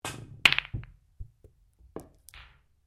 Billiards Cue Ball Ball Pocket, Home billiards table; Pool; Sports; SoundEffects-Foundation1